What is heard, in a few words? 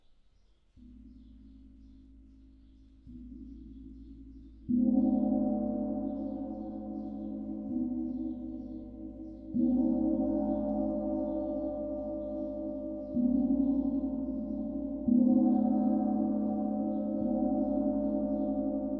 Gong